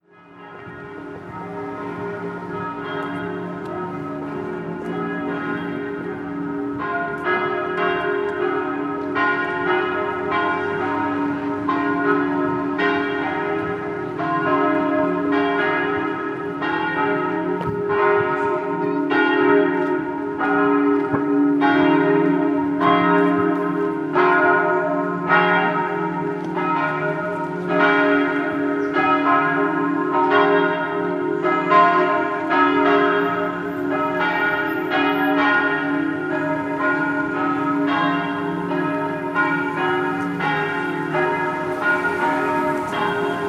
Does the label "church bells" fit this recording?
Yes